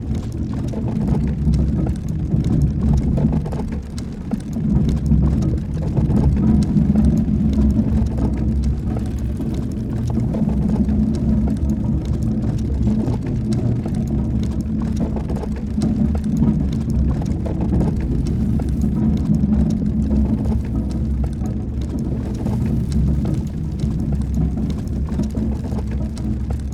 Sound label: boulder trap rolling loop; stone